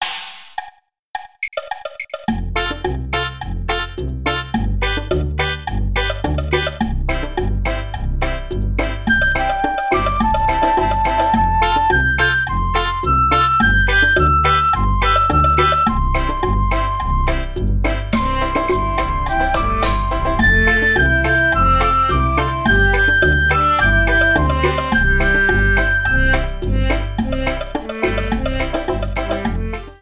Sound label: Chinese melody Qin 7 tones; Qin Yue